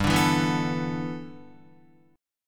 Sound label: G 6th Add 9th G Guitar, chord; G6add9 strummed